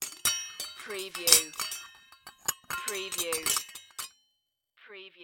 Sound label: Cartoon Bell Jingle